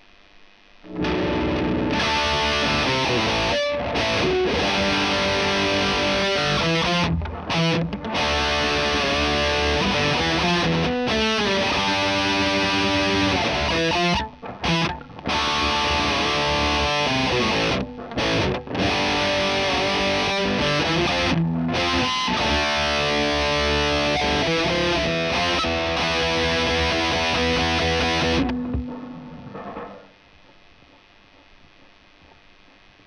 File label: E Gtr